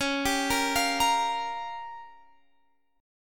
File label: Db 6th Flat 5th, Guitar, Standard; arpeggiated; JGuitar